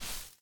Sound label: Footstep Foliage Bush Small; Viewfinder